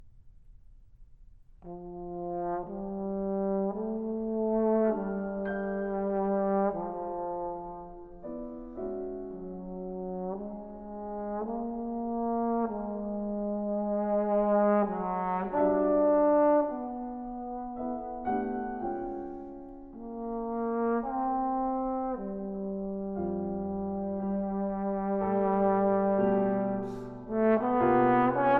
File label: Sonata for Clarinet & Piano - Mvt. 2. Andantino, Vivace a leggiero